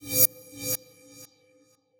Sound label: Synth Sting; cinematic drama; Stings; musicradar